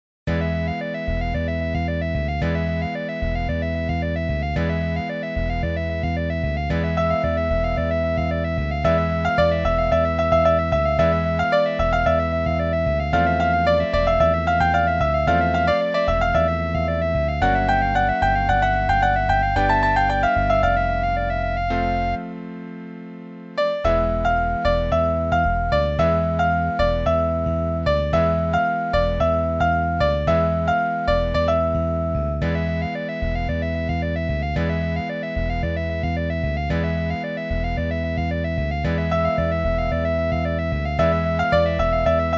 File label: Piano